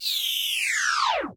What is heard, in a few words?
Tape Stop